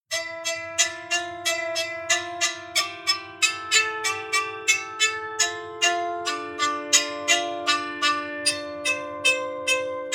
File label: soprano diatonic psaltery impro; loops